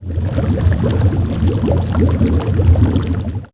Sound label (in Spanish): agua hirviendo